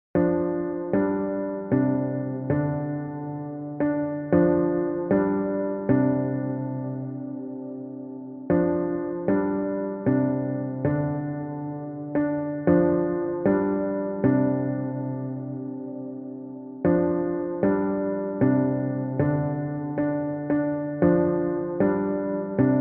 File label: Pianos Chords